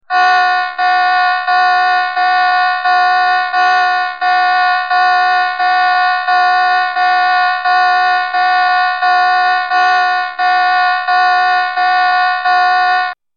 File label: general quarters alarm